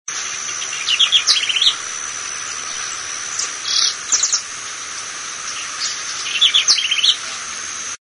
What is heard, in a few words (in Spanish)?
ratona común